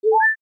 ringtones heart

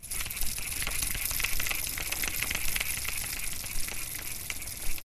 reeling in catch

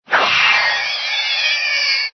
ANIMAL CREATURE

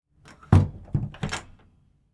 Door Closing

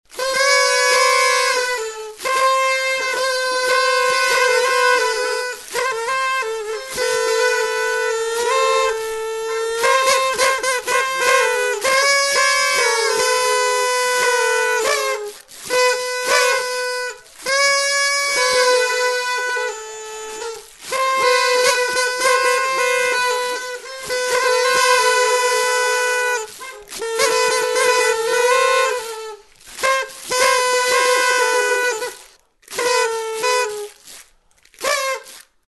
trumpets party

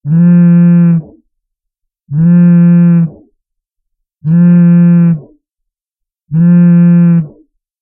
phone vibrating

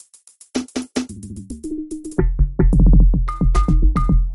drumloop

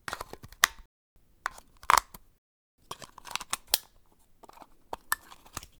change battery

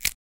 finger breaking

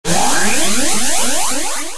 level up

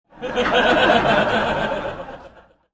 Laughter

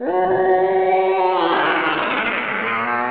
dilophosaurus

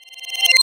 ALERT

